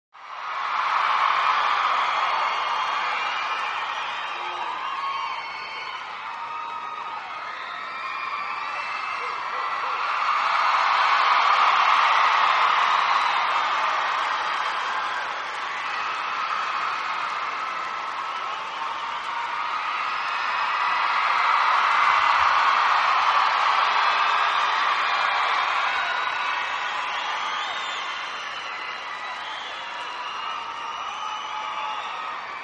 Huge Extended Crowd Ovation, With High Energy Throughout And Large Swells Very Excited Concert Crowd